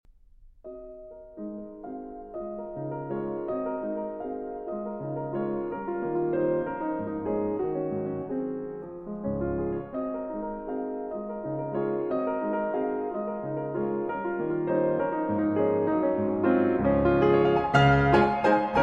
Valse-caprice in D Flat Major

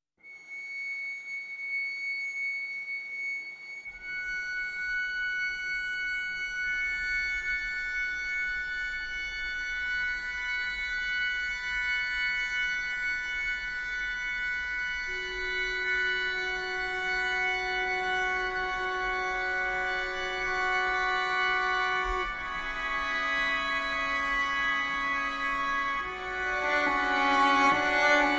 Cello

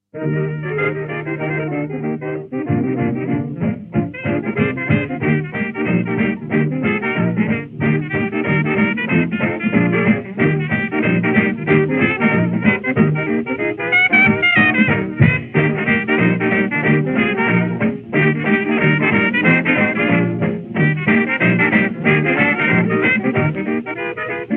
Jazz Blues

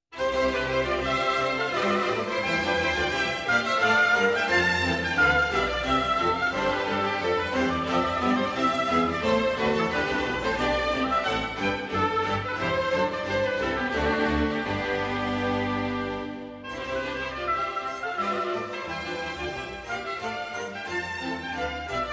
orchestral suite, courante; No. 1 in C major, BWV 1066

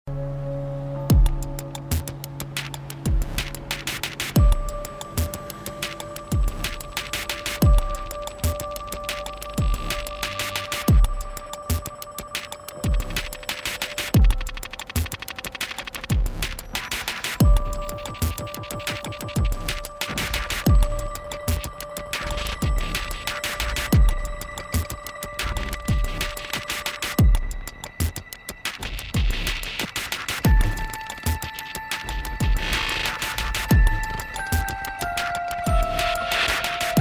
Oboe Round

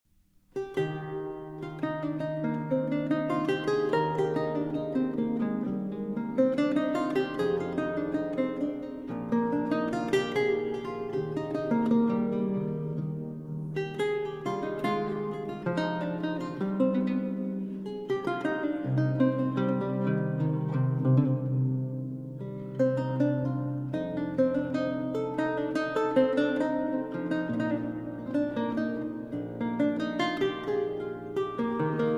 Cello Suite in G Major, Allemande; Cello Suite No. 1; BWV 1007 II.

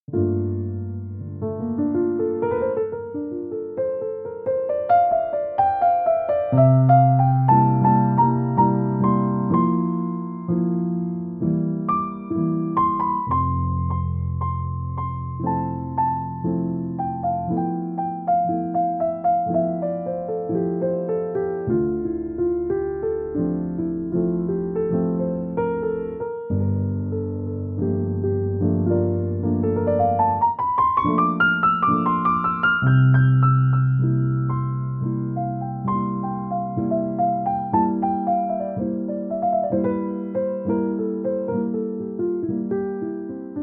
Jazz Instrumental Chill

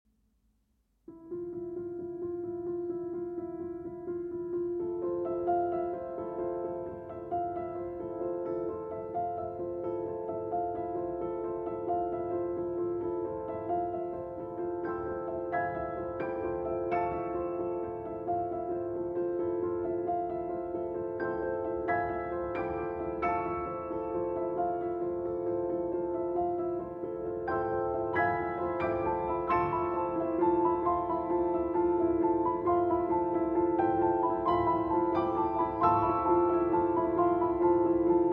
Piano Duet; Orphée; Act 2 - VIII. Le Studio d'Orphée

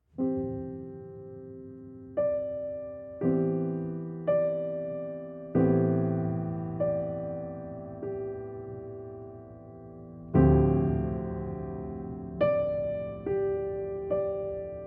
Improvisation

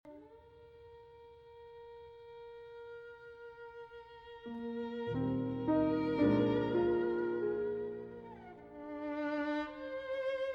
violin and piano; Saint-Saens Sonata No.1 For Violin and Piano in D minor, Op.75; 2. Adagio

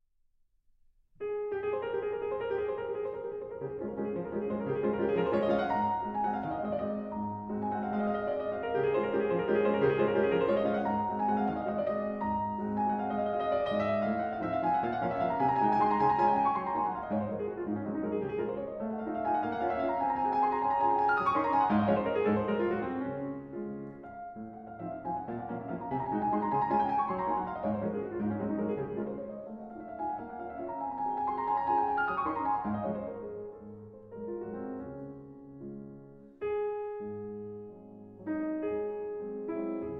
Waltzes, Molto vivace in D-Flat Major; Op. 64 No. 1; Valse Minute

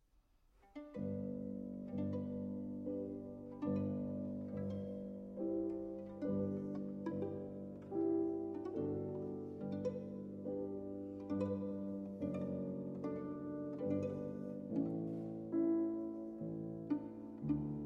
Violin Sonata in D Minor; Schumann; Violin Sonata No. 2; Op. 121

